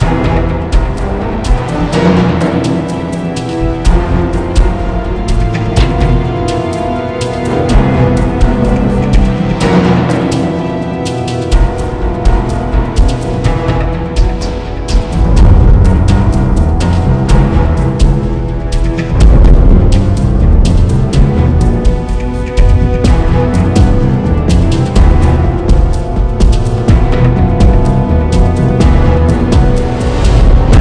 Warehouse Ambient; Gore: Ultimate Soldier

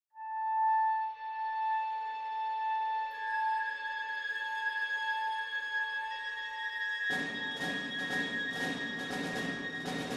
Ambient; Brothers in Arms 3: Sons of War

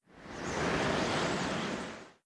Wind

